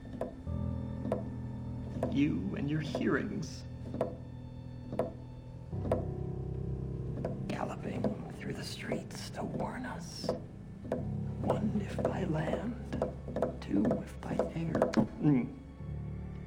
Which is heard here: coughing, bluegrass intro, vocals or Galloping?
Galloping